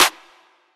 big room clap one shot f sharp key, Royality free hand clap tuned to the F# note.